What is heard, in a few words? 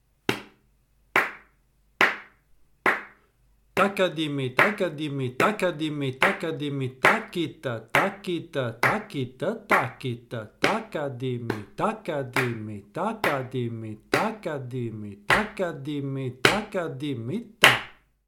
Jathi-gati